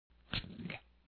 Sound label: Bottle Cap Drop